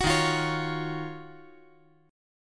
Eb Minor 9th Eb; Ebm9; strummed; JGuitar

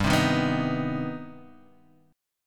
C Minor Major 7th, G, chord

